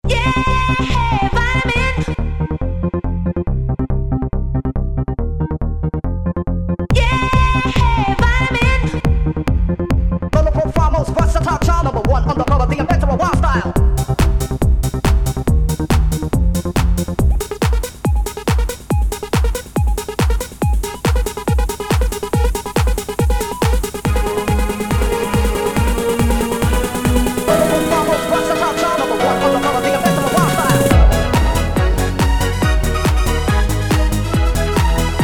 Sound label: guitar